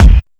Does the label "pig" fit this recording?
No